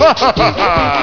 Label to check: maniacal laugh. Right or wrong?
Right